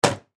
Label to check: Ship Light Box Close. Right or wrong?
Right